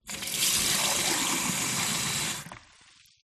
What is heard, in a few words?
jug fill water